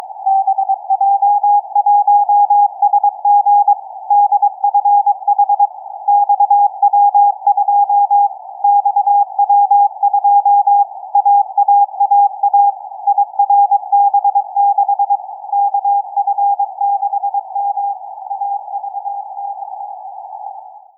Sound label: 145mhz975 cw; XW2F CAS3F VERT